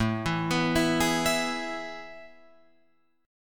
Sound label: A Major 7th Suspended 2nd, Guitar, Open F; AM7sus2 arpeggiated; JGuitar